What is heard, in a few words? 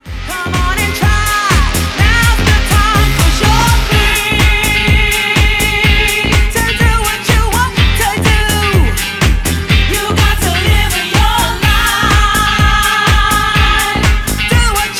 chorus